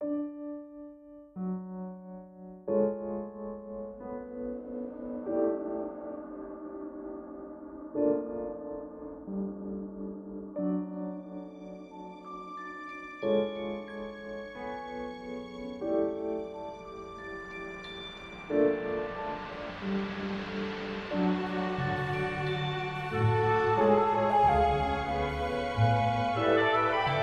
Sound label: music Mysterious; Adventure Craft